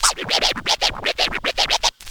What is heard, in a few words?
Scratch; Club-50 - Foundations Roland